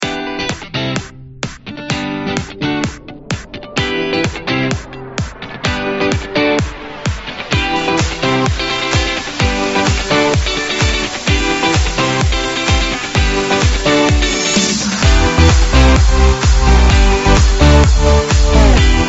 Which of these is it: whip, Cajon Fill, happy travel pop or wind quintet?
happy travel pop